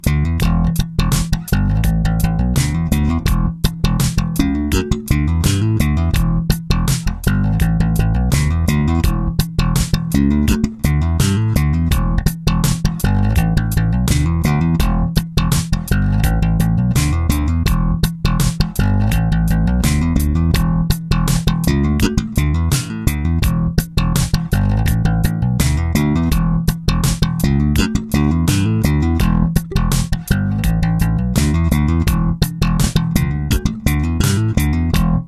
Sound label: bass